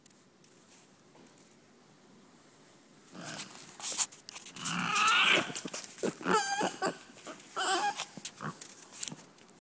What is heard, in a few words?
rarararaa grumble grumble